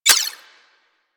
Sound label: Primary Mech, Weapons; Sapient